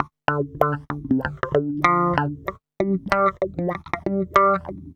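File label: RYTHM09, rythm guitar; USB Soundscan vol.31; Miro s Grooves; AKAI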